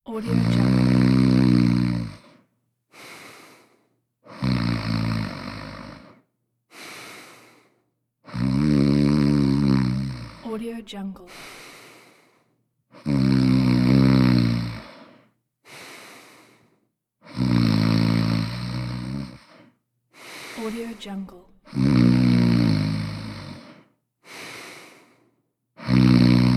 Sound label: the snoring